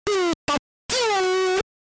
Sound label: Pikachu SFX; RBY